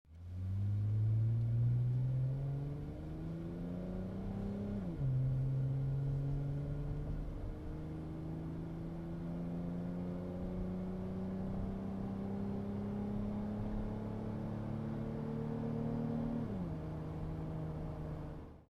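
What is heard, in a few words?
magnaflow incar, exhaust systems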